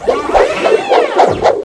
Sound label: ATTACK